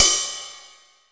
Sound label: Ride, Jomox Xbase-09; Drums